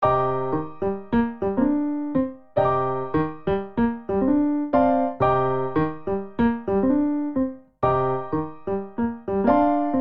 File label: Vamp Bass Chords Latin Soul D Minor 92 bpm, Grand Piano; MIDI Clips; Ableton; Factory Packs